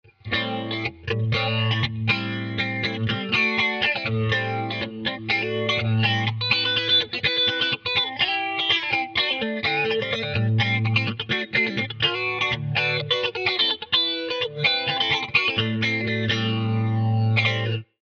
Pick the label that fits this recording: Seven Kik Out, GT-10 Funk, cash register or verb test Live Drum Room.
GT-10 Funk